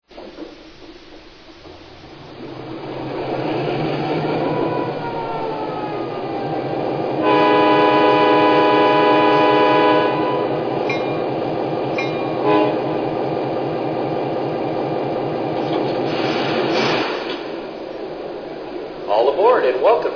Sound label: F3, electric trains